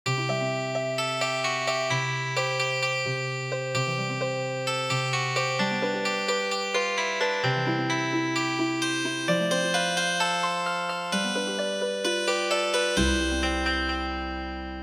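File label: unplugged Healing Harp; midi; traditional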